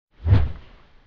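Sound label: Low, whoosh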